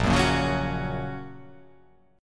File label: A sharp Minor Sharp 5th A sharp down